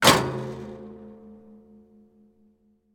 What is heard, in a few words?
impact metal fire grill vibrate resonate